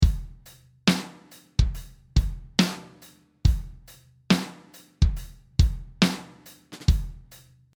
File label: downbeat 70